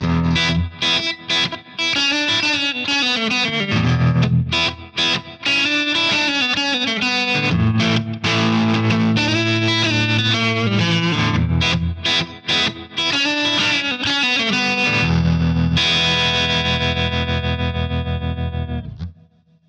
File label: Reverb Surfer; Guitar Rig 7; Reverb Delight